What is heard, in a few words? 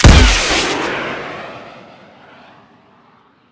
pocket rocket shoot; tf2